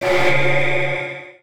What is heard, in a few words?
tormentor reflect; ambient; dota